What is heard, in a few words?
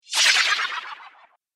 Spell Casting A Shadow, magic sound effects; Rpg